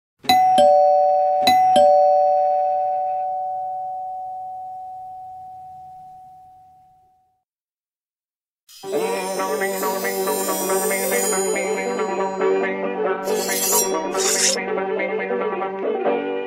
Tuerklingel

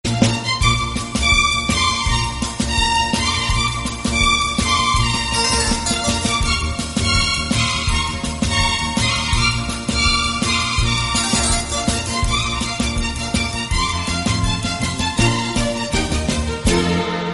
Filmmusik

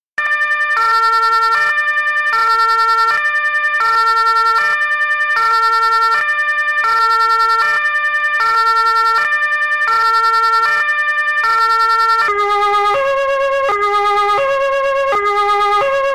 Martinshorn

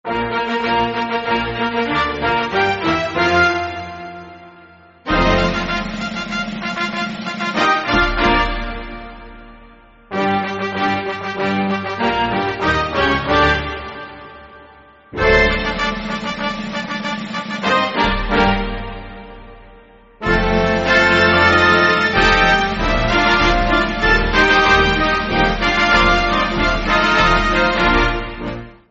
italienische nationalhymne

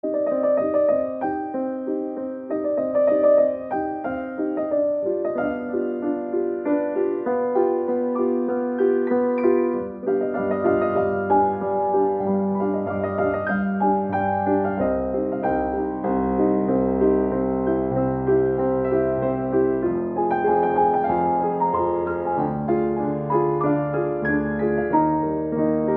Buschtrommeln

